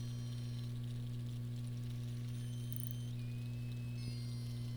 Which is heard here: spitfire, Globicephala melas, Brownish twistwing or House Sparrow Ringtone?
Globicephala melas